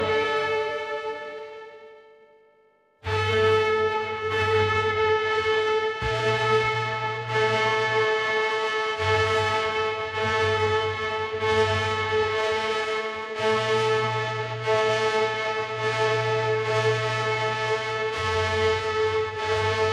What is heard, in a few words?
ringtone cinematic